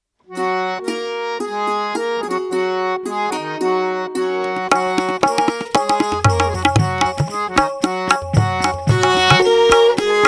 Gents Karaoke F Sharp Pancham

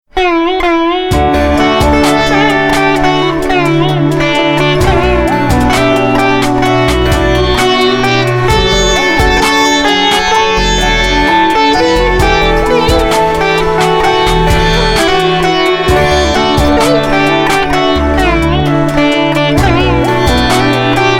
Ringtone; RADIOSAI; HARI BHAJAN BINA

